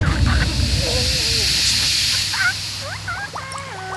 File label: Kaos FX, Ambient Grooves